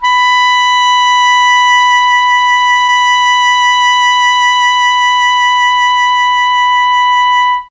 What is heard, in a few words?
B3 alto sax, mellotron; CHMB ALTOSAX